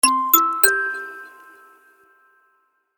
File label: notification